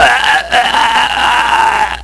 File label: gaaag; HyperHangMan